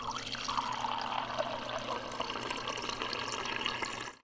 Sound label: Water Pour